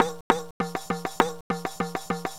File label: Baladi Riq, Acoustic Beats; Sonic Foundry (Sony Creative Software); Myths of Technology